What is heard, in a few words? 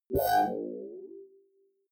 query smooth high, signale